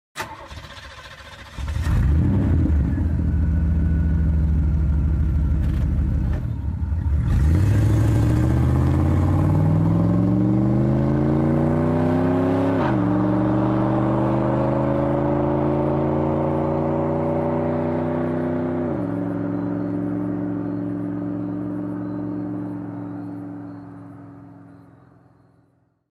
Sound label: Muscle Car V8 Sound